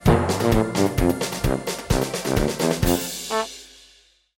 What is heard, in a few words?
prize pick music end; Fluffy Favourites Remastered; 15 years of Fluffy